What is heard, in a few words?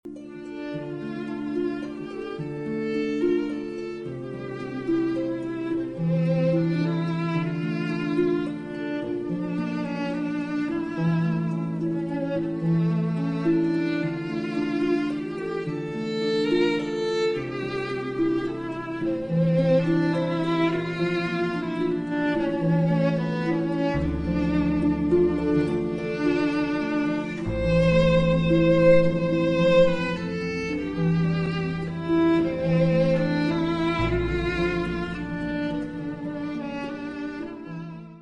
Greensleeves Instrumental